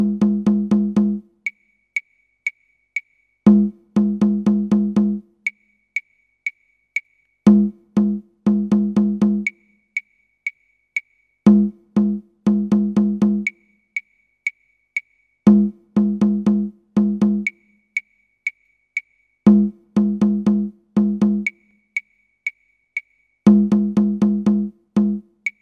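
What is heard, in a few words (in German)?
Rhythmusecho zum Aufwärmen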